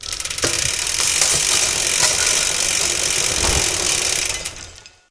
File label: item crate shuffle